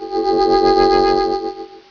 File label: teleport